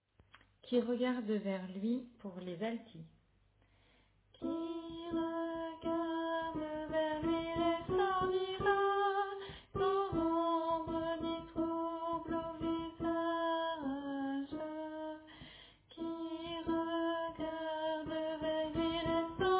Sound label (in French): Alto; St-Jean-Baptiste-de-la-Ste-Famille